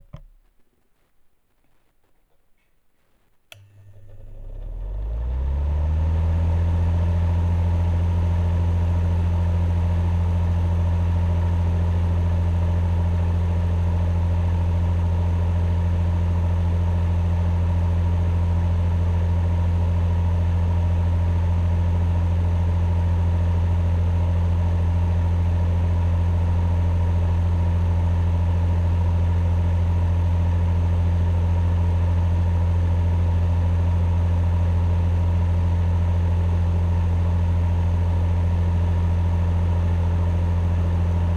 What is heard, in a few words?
electric heater; tascam